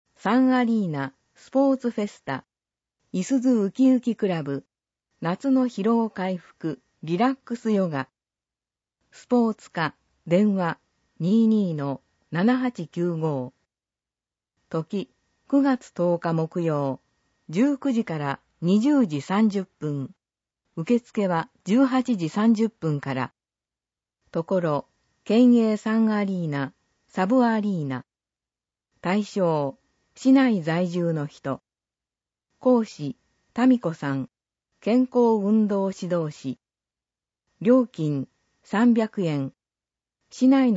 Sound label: relax yoga